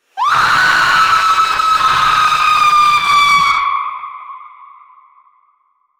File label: witchs anguished scream; sound effect